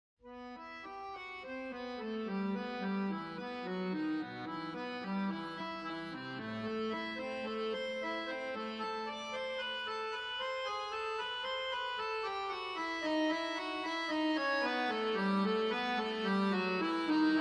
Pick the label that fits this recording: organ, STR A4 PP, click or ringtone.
organ